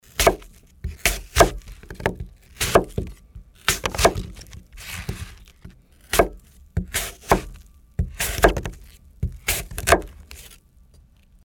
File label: cut apple